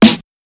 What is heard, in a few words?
Snare